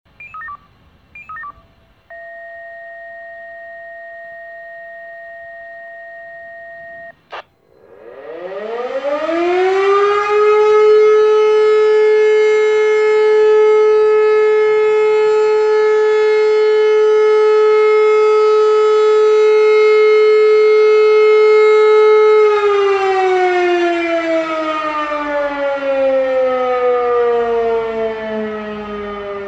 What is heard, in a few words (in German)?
sirenenprobe